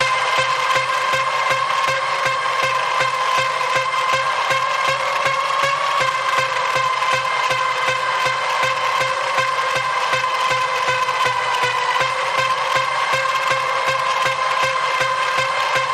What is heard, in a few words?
Paranormal Panning Pulse C, Key of C